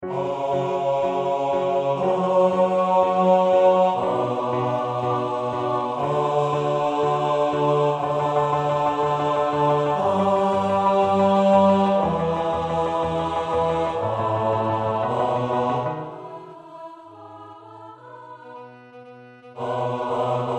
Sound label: Bass from D